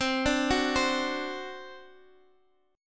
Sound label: C Suspended 2nd Flat 5th C